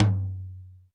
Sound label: Roland U220 Dry Set